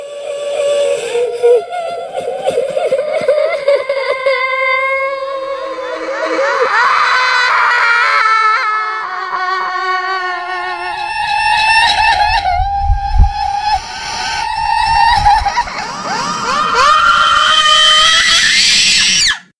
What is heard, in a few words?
girl crying 1 (ghostfx); HellSign